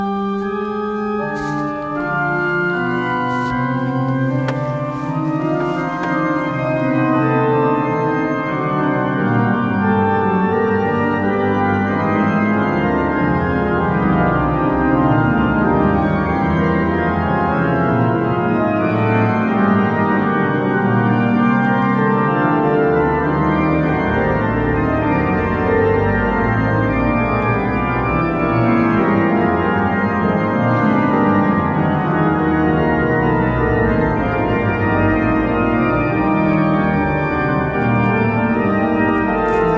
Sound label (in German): Orgel